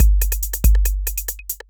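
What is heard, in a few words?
DRUMLOOP ELECTRO 140; Techno2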